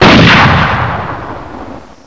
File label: sniper fire